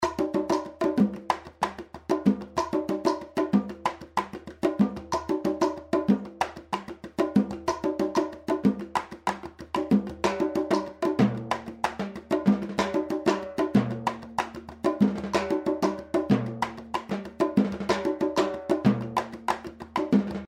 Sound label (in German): erst vier Takte des Preset-Grooves, dann vier Takte, in denen die Timbales durch Erhöhung des Komplexitätswertes in den Vordergrund rücken; Steinberg Groove Agent 4